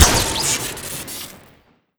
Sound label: tool gun shoot crit; tf2